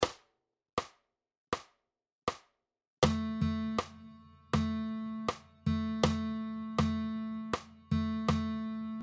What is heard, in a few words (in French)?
Dictées de rythme, guitare